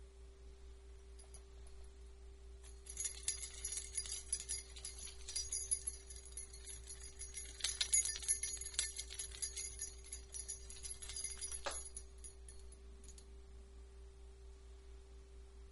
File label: binaural